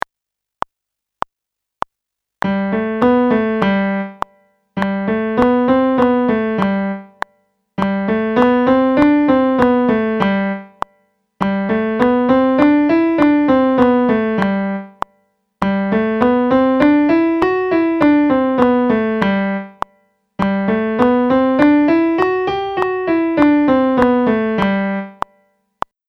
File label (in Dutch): Toonladder G oefening piano